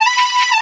vocal ultraviolet, Am; SD AURORA Sample Pack; Slate

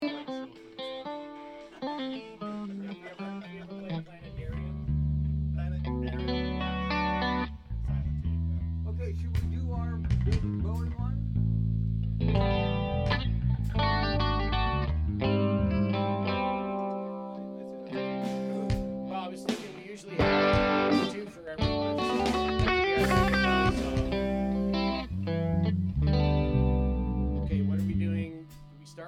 Strings Only

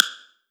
Perc Rim Bright, 909 Percs; Slate

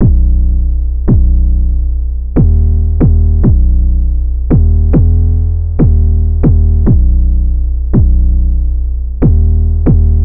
808 loop 140 B; Slate Digital BASSHOLE Sample Pack

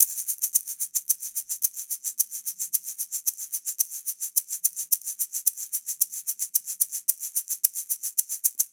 afro shaker loop, 110; perc construction loops; shakers afro; SEISMIC Drum Sample Pack; Slate

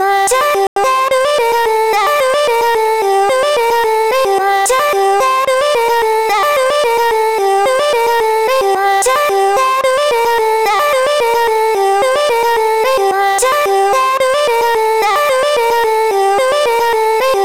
vocal chop, 110, Gm; Divine; Slate Digital Omnivox Vocal Sample Pack; Chops

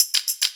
hats, Pop; Slate; SD PASADENA Pop Sample Pack

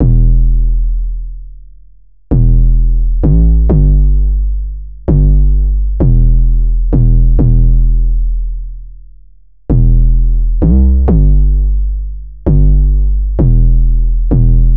808 loop 130 Em; Slate Digital BASSHOLE Sample Pack; 808 Loops

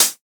hat, drum sample; Drum Sample Pack; One Shots; Hats; burst; Slate; SEISMIC

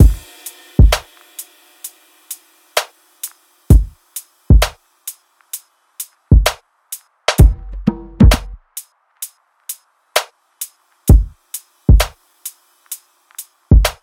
drums 65, Full Drum Loops; Slate; SEISMIC Drum Sample Pack

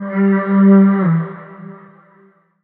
vocal artic G; Slate; AURORA Sample Pack; Vocals

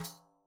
daf Single hit; Slate; SEISMIC Drum Sample Pack

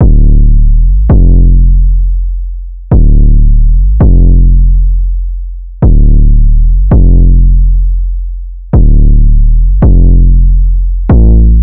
808 loop 165 Dm; Slate Digital BASSHOLE Sample Pack

